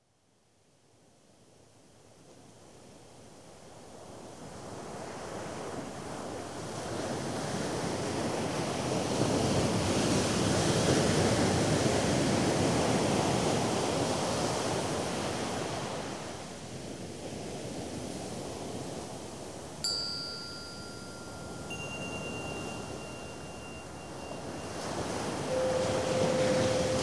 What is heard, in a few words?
Endless Sea, Music for Yoga, Meditation and Relaxation; Ambience